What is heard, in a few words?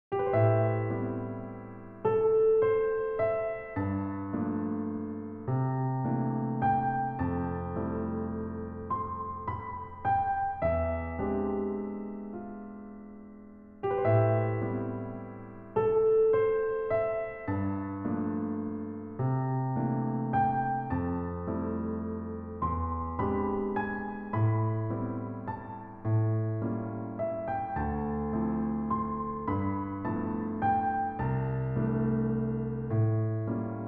Instrumental; Toho Complete Box; Touhou Red